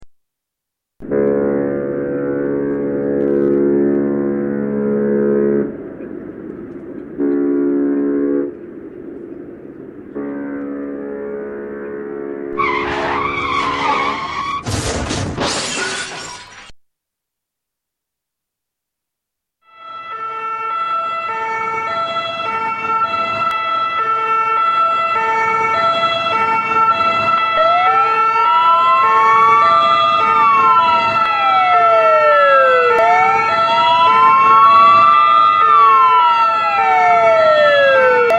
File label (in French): Sirenes